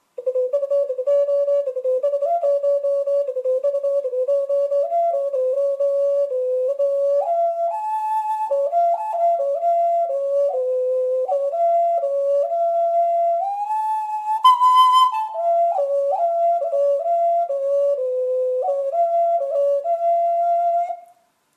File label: Inka Alto